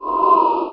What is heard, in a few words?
frost; Undercroft